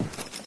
wood, player; Source Engine; dod

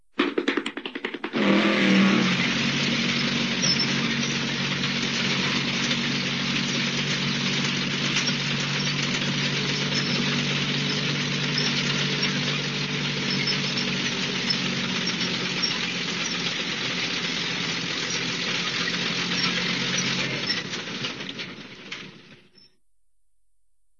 flintmobile (start up and run); cartoon; fred's flintmobile; hannah-barbera

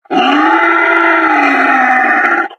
yeti statue growl; Source Engine; tf; lair

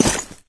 wet, footsteps

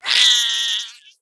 egg pain

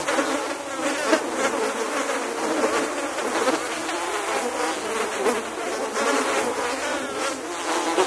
mosquito loop; Left 4 Dead 2; Source Engine; ambient

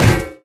physics turret impact lg

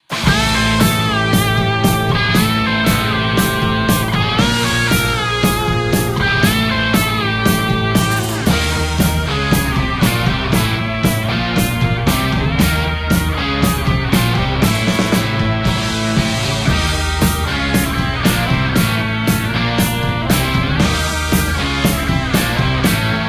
midnight tank, music; Left 4 Dead 2; Source Engine; video games

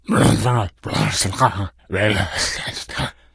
mumbling, infected idle

